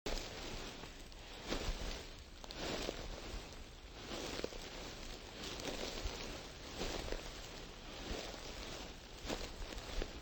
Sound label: grass steppin